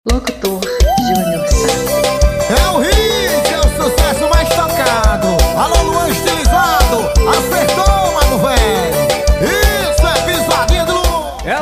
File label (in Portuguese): piseiro